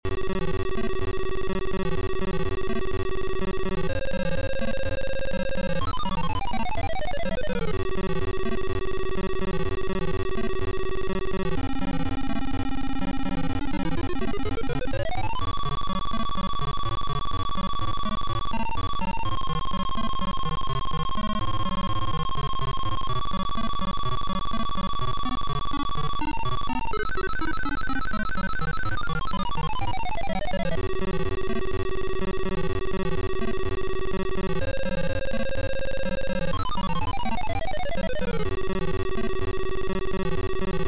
Title Beeper; Cauldron 2

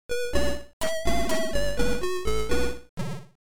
Title AY; Hawk Storm

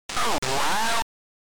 Title AY Beeper; The Arc of Yesod

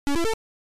Title Beeper; Magnetron

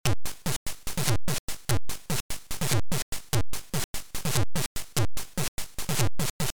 DRUM

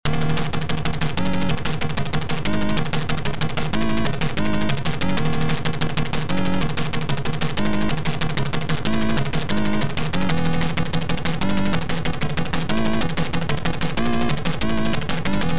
Game music; Santa Goes Psycho 2

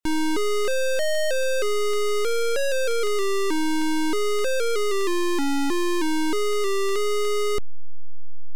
Title Beeper; Martianoids

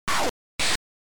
Title AY Beeper; The Arc of Yesod

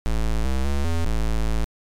Start, Beeper; Hunchback

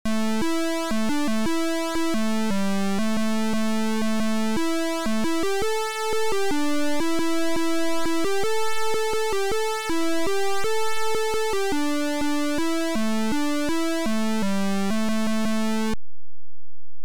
Title, Beeper; Fire Lord

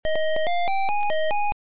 Start Game, Beeper; Cookie

